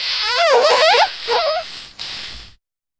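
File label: baby sea monster splashing, tentacles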